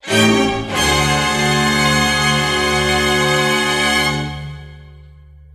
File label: string pair, stabs